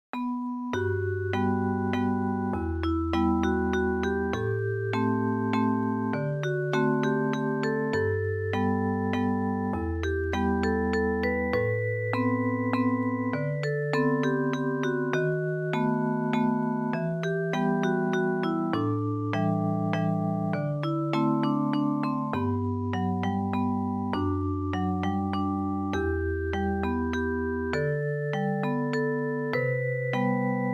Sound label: short melodies melody of early spring